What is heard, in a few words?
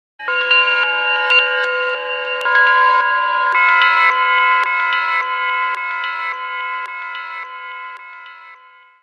Sanukite alarm; 8800; Alert tones